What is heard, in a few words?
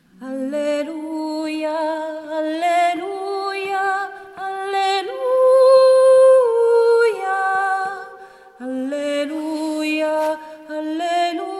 Alleluia SOPRANI; ARCIDIOCESI DI SANT’ANGELO DEI LOMBARDI-CONZA-NUSCO-BISACCIA